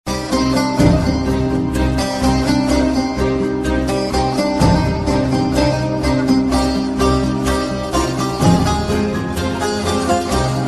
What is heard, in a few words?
Theme Instrumental Ringtone; Money Heist